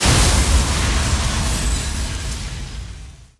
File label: Building Floor Collapse